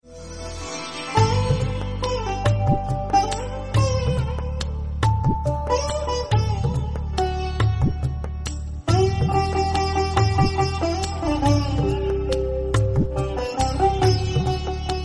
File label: sitar ringtone; dastaan darma; bollywood ringtones